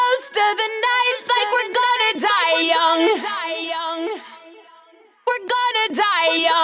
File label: Vocal Echo